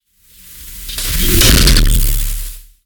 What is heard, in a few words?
Distorted Hit